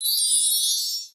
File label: fire magic appear; run-dorothy